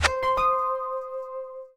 Character Attack

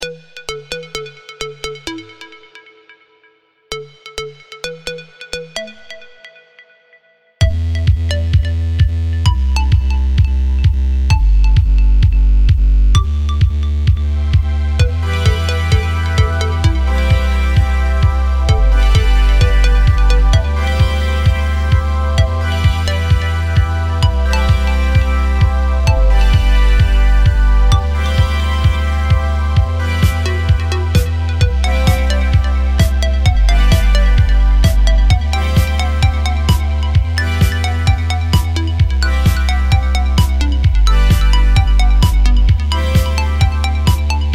Ending Music; Joe Jump; Android

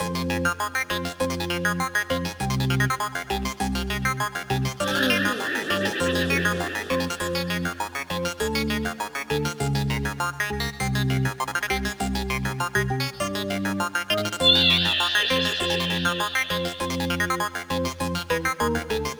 City Layer loop mono; LOL City; Idle Mastermind; Android, iOS, mobile

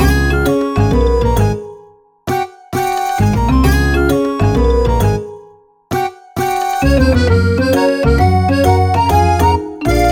Holiday loop, 132 bpm

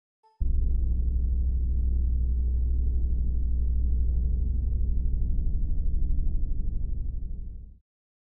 Mode Ambience; Five Nights at Wario's Origins

